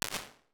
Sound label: fast short, Tiny Rooms, IR Samples; Convolution; NI Komplete; Kontakt 5